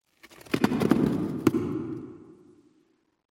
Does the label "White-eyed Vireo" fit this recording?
No